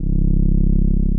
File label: SOFT BASS; Masterbits; Dance Trax